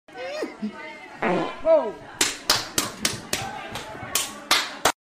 Laughing; Ishowspeed; Joe biden

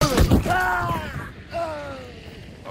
Dying groan; Hunt Showdown

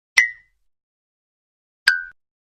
plink

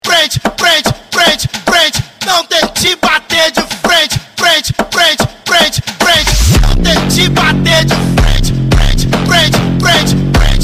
phonk

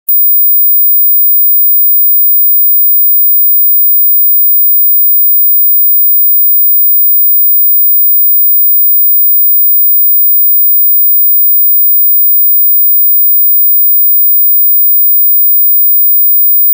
Dog Whistle

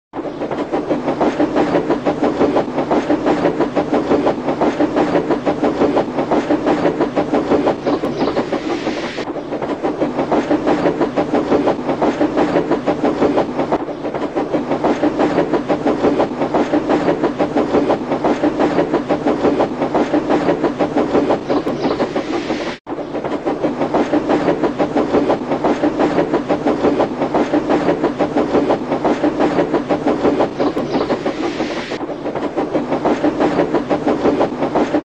Thomas Puffing

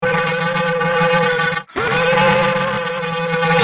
broken quest mic loud; made with Voicemod

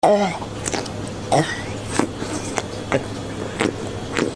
Pufferfish

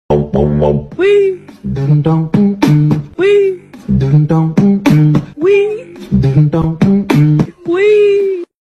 Beatbox Wee; Meme

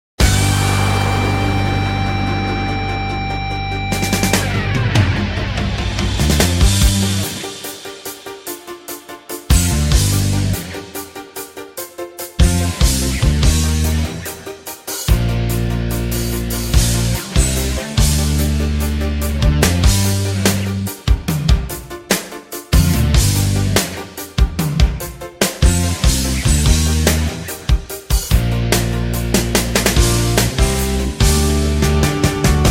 song instrumental; pokemon

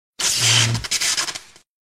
Electric Shock

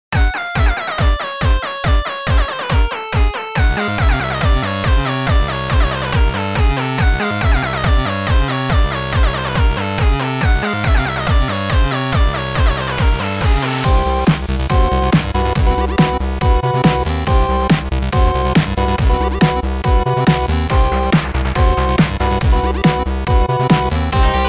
8 Bit Remix; JACKIES BOX

